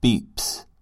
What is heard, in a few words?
beeps